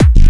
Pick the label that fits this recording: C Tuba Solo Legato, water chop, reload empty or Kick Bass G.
Kick Bass G